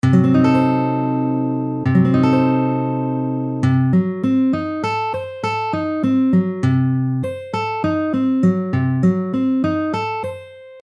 Septakkord Cdim7

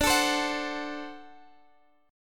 Boj D7

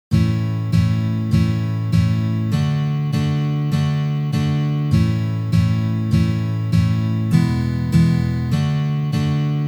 Uprazhneniya dlya igry akkorda Lya mazhor na gitare

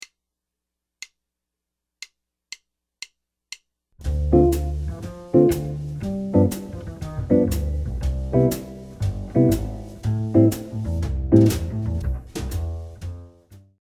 Dzhazovaya posledovatelnost akkordov

